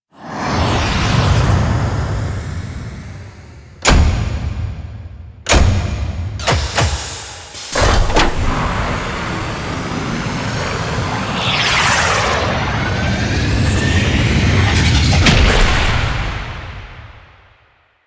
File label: Extraction Outro Exterior Cinematic; Warframe; Lotus Outro